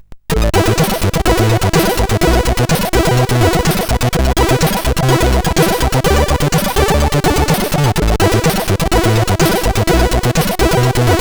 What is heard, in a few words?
Trap, sid CSG8580R5; Morton Adam